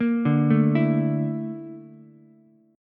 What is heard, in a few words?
A sharp Major Sharp 11th, Ukulele, C6; arpeggiated; JGuitar